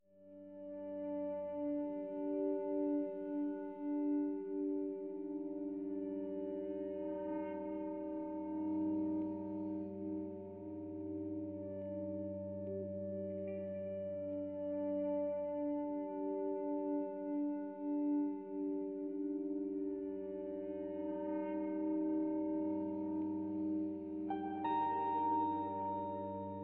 Ambient Guitar

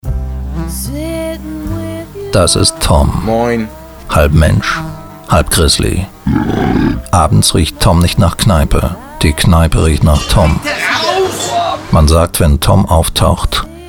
Funk; Maxdome

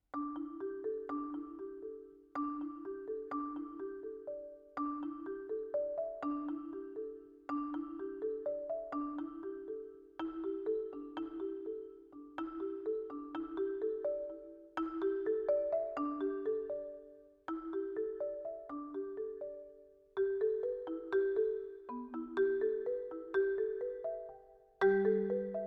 Glocken Blocken

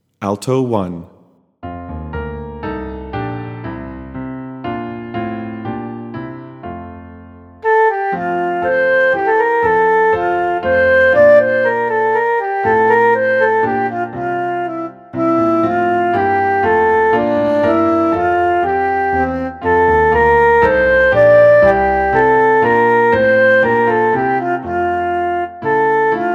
Alto

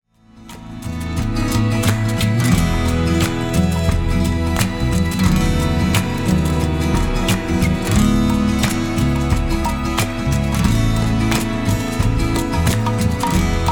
Instrumental Version

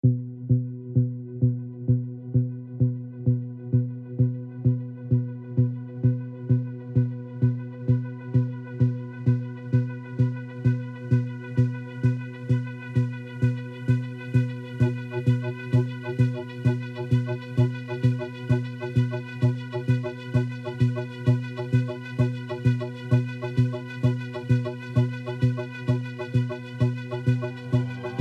Vague 130bpm

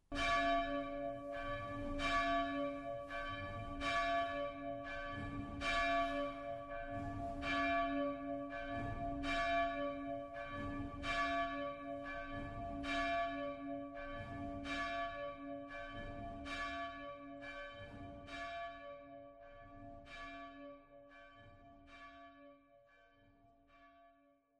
Cloche

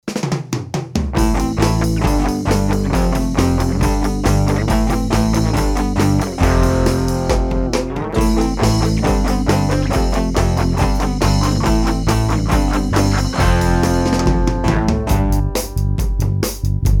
bone drum

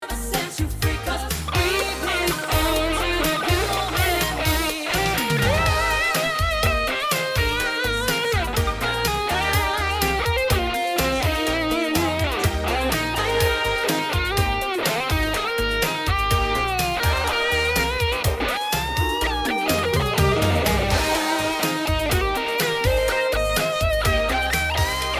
Gtr Solo Overdub

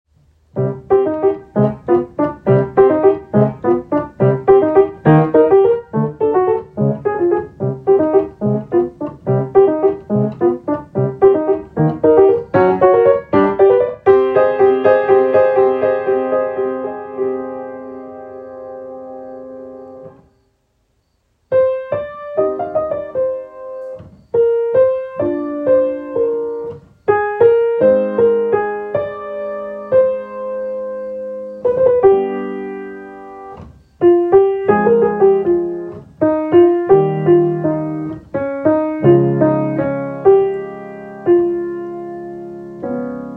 Flamenco

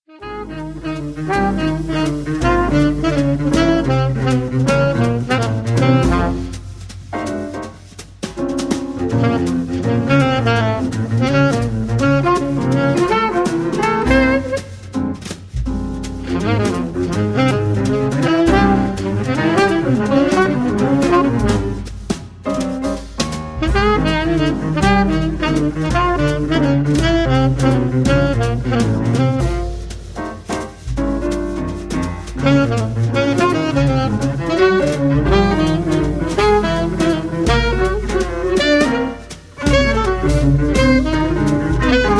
Background Music

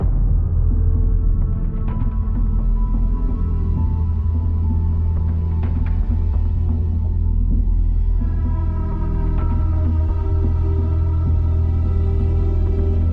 Ambient Tension Loop

